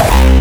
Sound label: kick C5